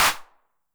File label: HAND CLAP, 909; classic machines; 909mod; HC